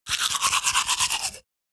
Toothbrush cleaning teeth brushing teeth short

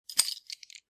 Coin handling picking up

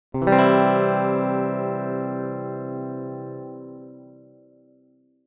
Electric guitar C major single soft strum

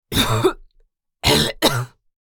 Adult female dry cough

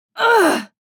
Young woman grunting in pain

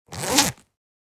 Money pouch zipper; purse

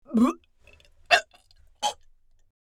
Female old woman choking; misc human

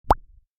Bubble pop

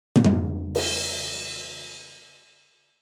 Cartoon ba dum tss

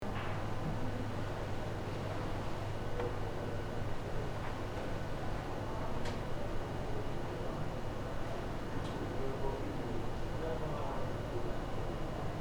Hotel room ambience tone quiet loop

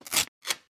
Paper ripping quick

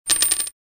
Game coin drop

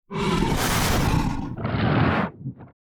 Werewolf breathing heavy monster

